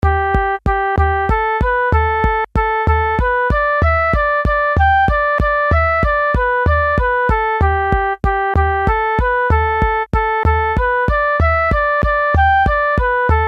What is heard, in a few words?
Kesh Jig with slow rhythm